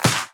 Clap; Mother Earth

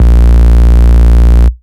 Root A#, Ultimate Bass; Vengeance Samples

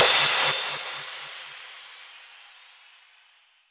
Cymbals Crash; Vengeance Samples

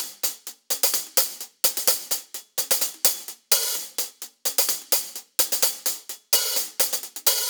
Real Hihats, 128BPM; Vengeance Samples; VEH2 Loops

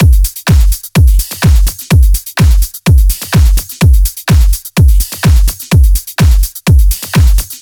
126BPM Kit; VFH1 126BPM Comeback Kit

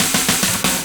Fill 140 BPM

